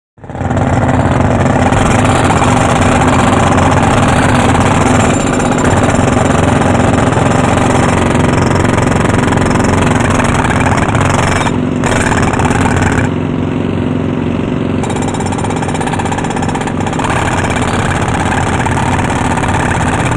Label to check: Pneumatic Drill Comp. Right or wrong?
Right